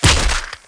SW ARMR; thief

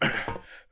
ladder; Daikatana

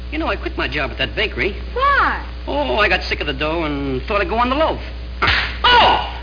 bakery; sblaster

